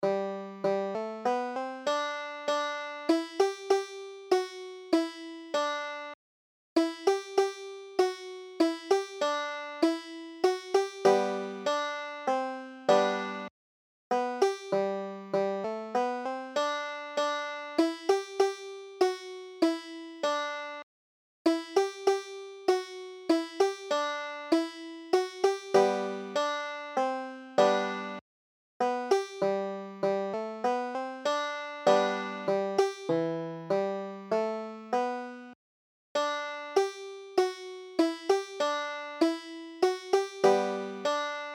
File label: first noel, banjo; Christmas; Standard G G